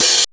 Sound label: dx hats